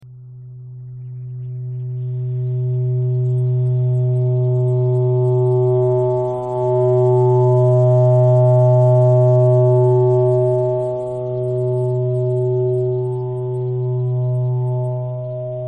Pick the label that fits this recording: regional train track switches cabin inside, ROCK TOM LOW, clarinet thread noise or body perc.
clarinet thread noise